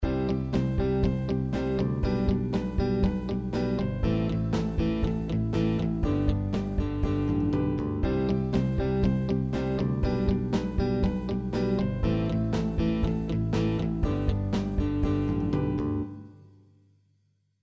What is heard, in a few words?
Bandpattern Rock; Popularmusik